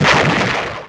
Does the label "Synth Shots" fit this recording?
No